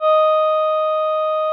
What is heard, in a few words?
VP-330, String Machines